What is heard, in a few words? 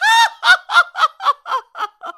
Laugh Big; Yuga laughing